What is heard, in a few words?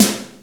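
snare crack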